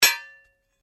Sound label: Blade Heavy Moving Impact Ring, Sword; Foley sounds for melee weapons; Swords (Sound Effects:Combat)